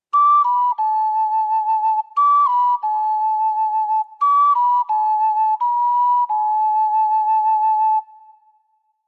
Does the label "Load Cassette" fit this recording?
No